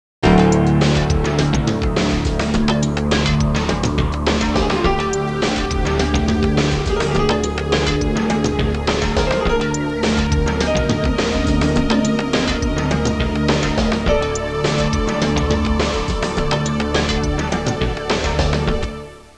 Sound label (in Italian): suonerie musica ritmica